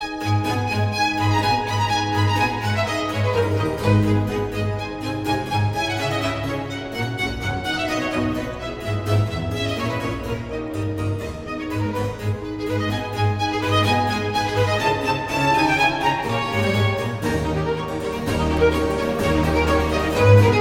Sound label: Violin Concerto in A Minor; Vivaldi; RV 356